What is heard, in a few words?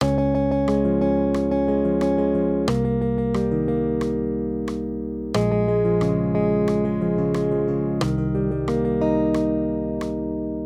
maj triads